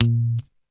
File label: GUI A#2, E-GUI MUTED; Sound & Vision; Gigapack I CD 2